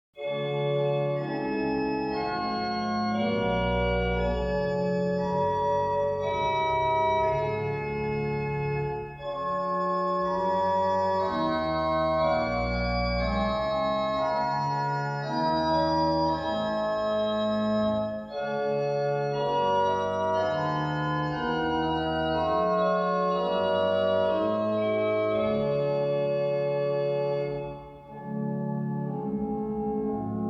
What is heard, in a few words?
Chorale Preludes; Reger; 30 Short Chorale Preludes; 29 How Lovely Shines the Morning Star